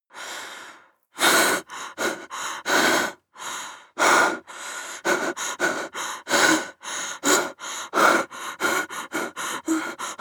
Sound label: breath female fear sequence